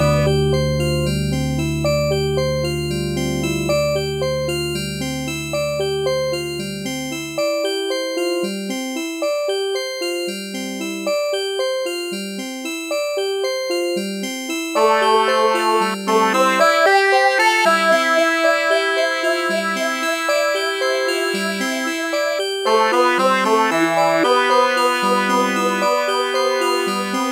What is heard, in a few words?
Jellyfloat Pool, Carrying; Pikmin 2; Olimar